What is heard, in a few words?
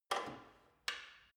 gym bicycle saddle unlock soft